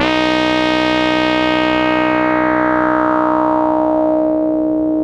Anasound Long hi C, Industrial Analog Single Notes; Sonic Foundry (Sony Creative Software)